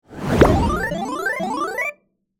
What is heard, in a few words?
level up